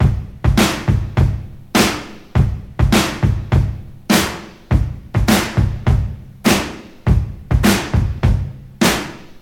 102 bpm hq drum beat g key, breakbeat sample - kick tuned to the G note. Loudest frequency: 1274Hz; drum loop